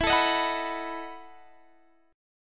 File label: E Major 7th Suspended 2nd Suspended 4th, Guitar, Standard; EM7sus2sus4 strummed